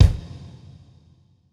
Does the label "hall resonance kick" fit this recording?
Yes